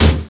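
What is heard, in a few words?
collision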